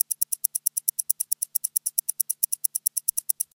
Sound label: Timer Loop; Angry Birds